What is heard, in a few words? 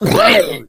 female zombie growl; player; voice; source engine; left 4 dead 2; boomer